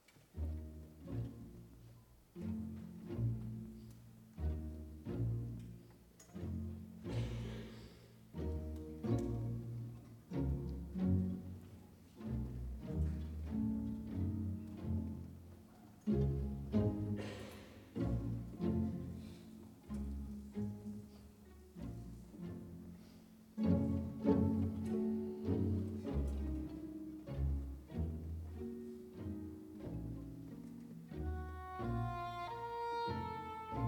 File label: Symphony in D Minor, Orchestra; Franck; 2nd Mov; paducah symphony orchestra